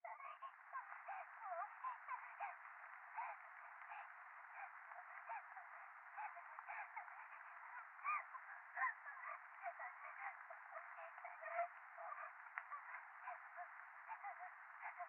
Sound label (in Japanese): 可愛い喘ぎ 雨